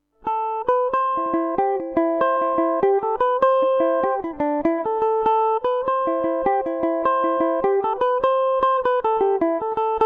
geese in the bog ador, mandolin; Revelation Jaguar electric mandolin

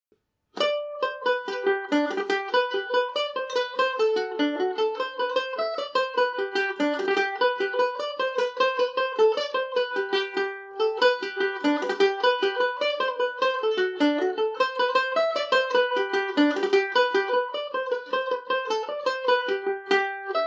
irish washerwoman gmaj for mandolin; mandolin cafe

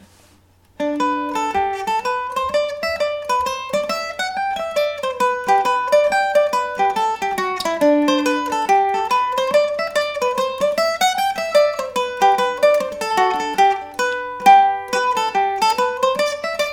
spoils of victory, mandolin

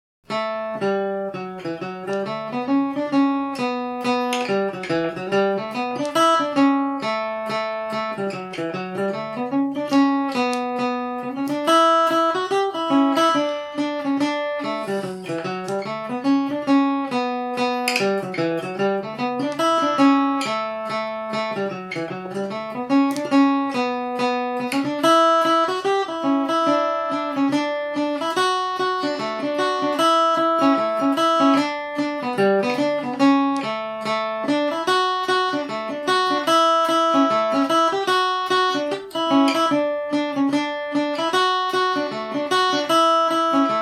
moonbeam dmaj, mandolin

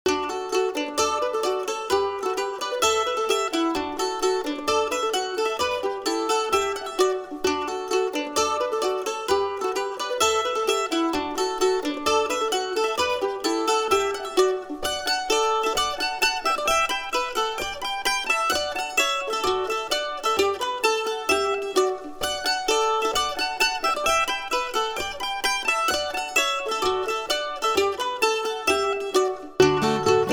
polkas, mandolin; Lockdown Polkas; The Lakes of Sligo

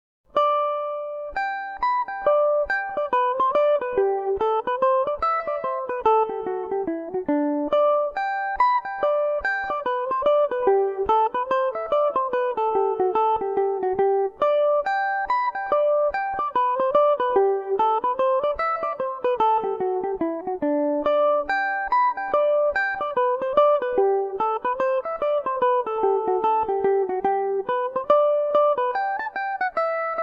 good natured man gmaj, mandolin; The Good Natured Man; Revelation Jaguar electric mandolin

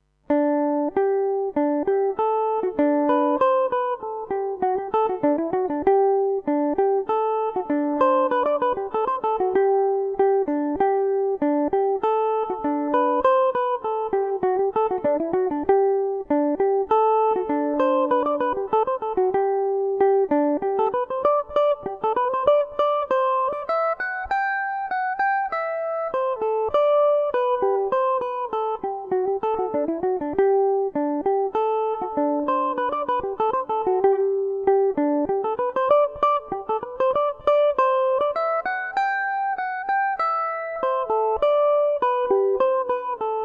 german beau gmaj, irish mandolin

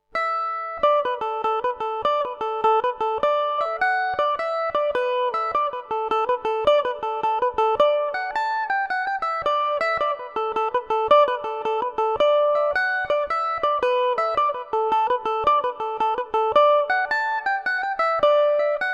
grandfathers pet dmaj, mandolin; D Major; Revelation Jaguar electric mandolin

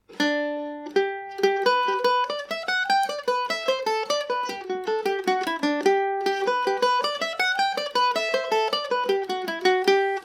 bridal jig gmaj, mandolin

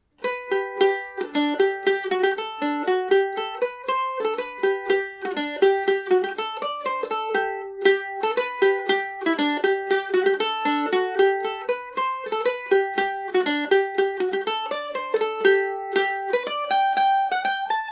tom carrolls polka, mandolin; G&O #34 mandolin

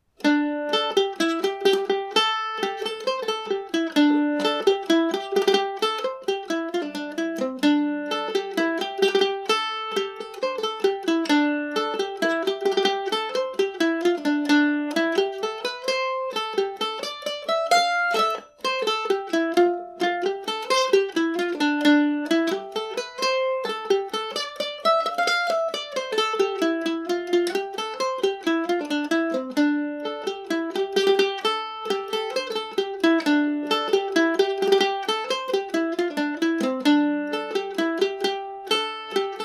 mandolin; maids of mitchelstown

